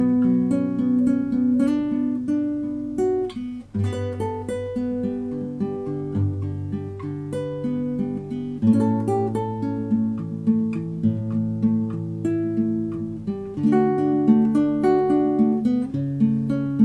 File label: Romantic Soul